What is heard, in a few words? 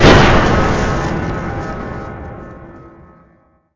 Piano Fall Other; Android, iOS, Mobile; Talking Roby the Robot